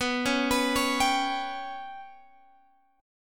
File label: B Suspended 2nd Sharp 5th down Guitar Open G